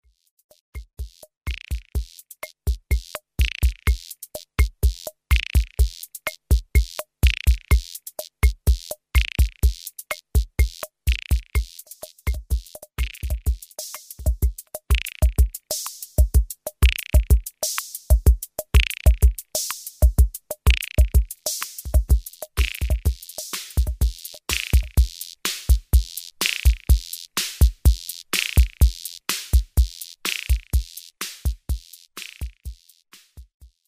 virus kb minipops

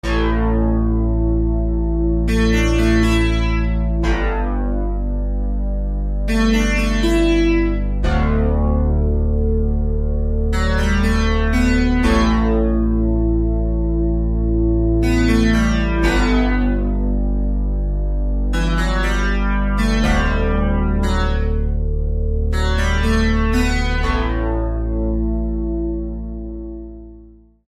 Synthex

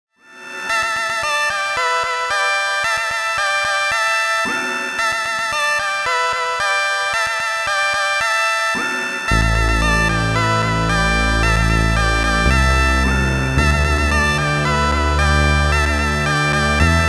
casio sa1 orient

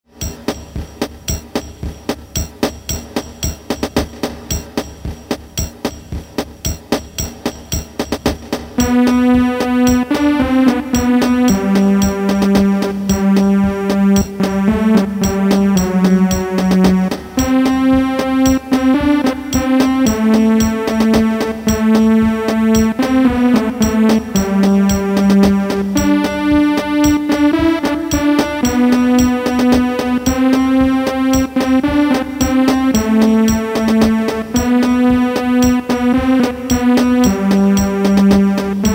Casio SA-1 march

